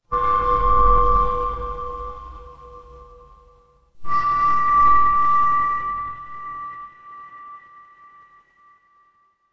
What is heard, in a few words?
horror creak